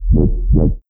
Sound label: Bass Wow Wow; Alepavesi